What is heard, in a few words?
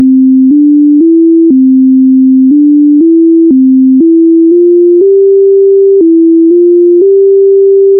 simple scale